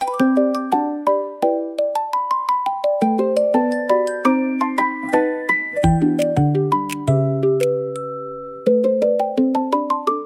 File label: instrumental bgm; 古钱币杜邦纸钱袋包; 产品宣传视频; coin pouch